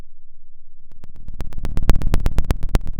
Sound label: pop, Sound of a fire crackling